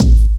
focused kick single hit A key, kick single hit tuned to the A note; kick drum samples